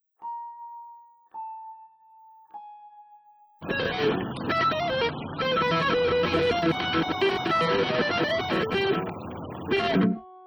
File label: guitar